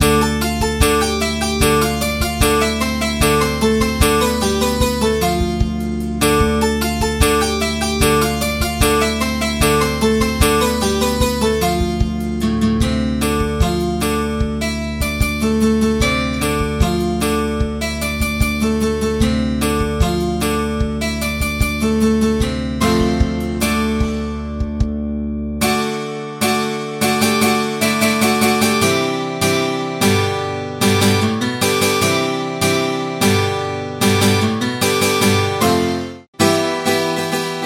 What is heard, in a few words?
twelve string acoustic guit